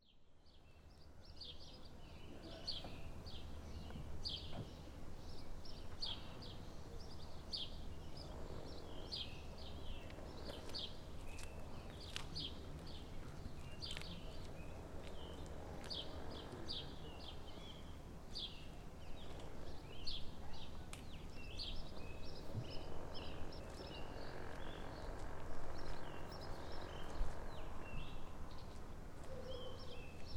Independencia, ruido

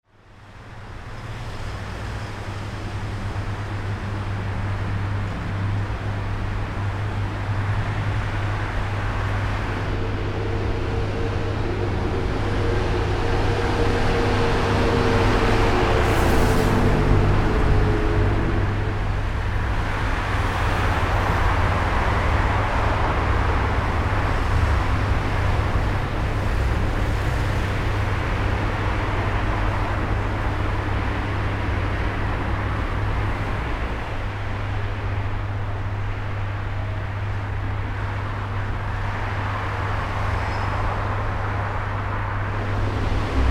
Valparaiso, ruido

